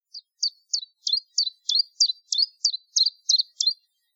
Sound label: Song; Iran 2017; Kelardasht